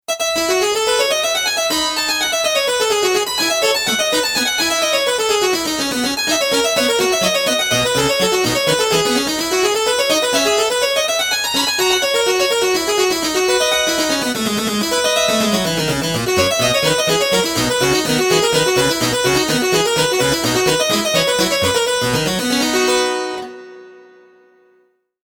Harpsichord; PC3

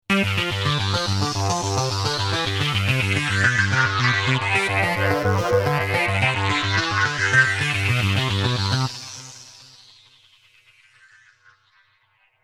Shaper Sweeper, Synths

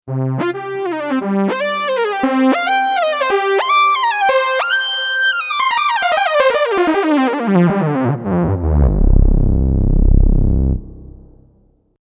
Micro Mogue Plus, Lead

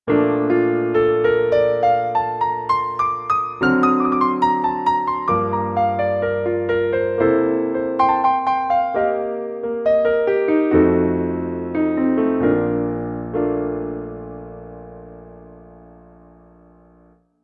Grand, Pianos; PC3

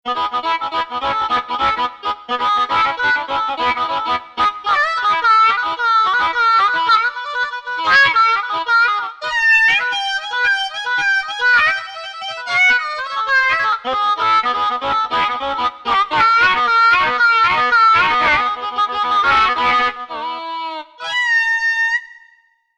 Blues Harp

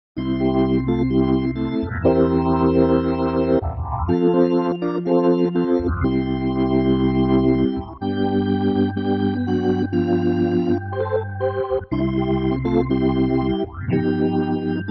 Hot Tube Gospel, Organ

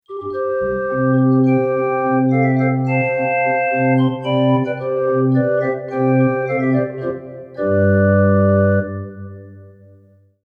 Pipe Stops, Organ

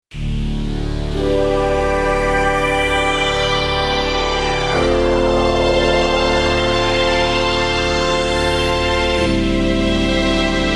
Phase Shimmer, Pads

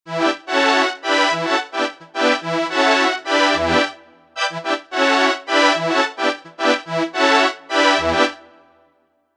ARP Fever

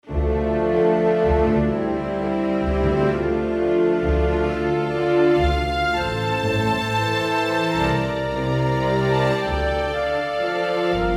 Adagio Pizz Split, Orchestral; PC3

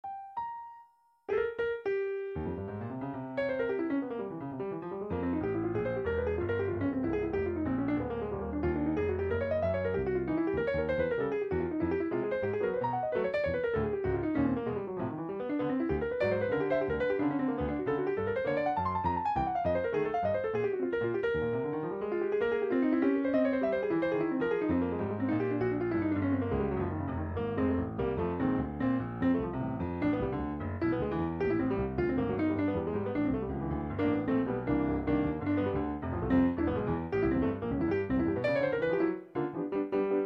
Stereo Dynamic Piano; k2600; ROM Option

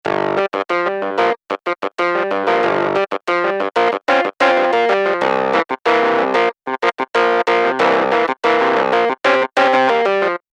Fuzz, Clav; SP4

